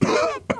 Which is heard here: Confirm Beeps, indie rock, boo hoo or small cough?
small cough